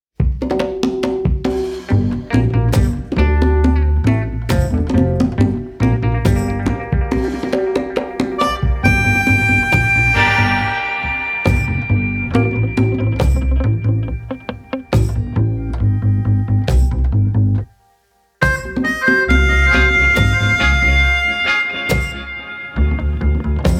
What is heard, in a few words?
City Reggae (Dub Ver.)